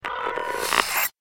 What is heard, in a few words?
Dialing Upload